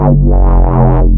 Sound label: trigger bass